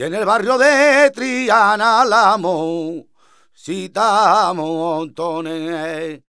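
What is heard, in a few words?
Flamenco, male cantaor, vocal; Best Service ProSamples vol.46; AKAI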